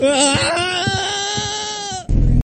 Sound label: Screaming